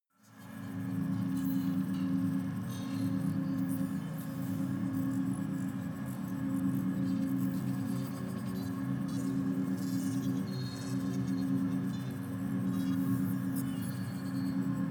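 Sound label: computerroom high, science fiction; atmosphaeren umwelt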